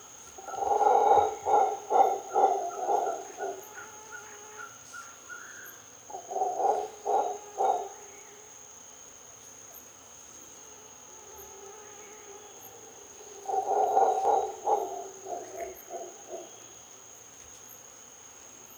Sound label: mantled howler alouatta palliata, zvuki revuna obezyany